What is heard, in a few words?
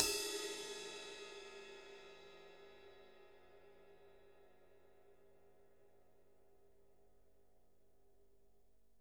RIDE